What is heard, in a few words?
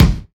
dry steel kick drum a key